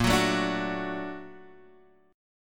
A sharp Augmented Major 7th A sharp Guitar Half Step Down